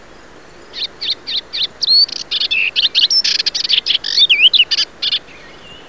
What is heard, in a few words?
Hippolais polyglotta